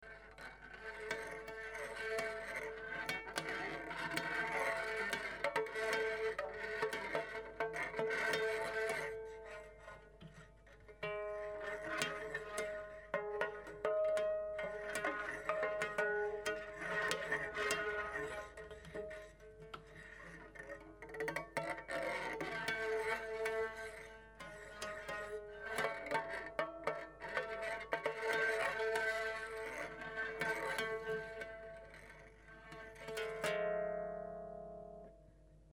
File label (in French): fils noeuds pince, harpe